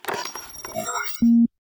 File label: calibrate bad, salvaging; Cosmic Rage; The Experience-light; ships